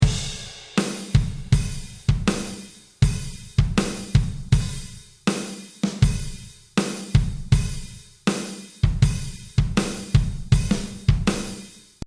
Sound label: Drums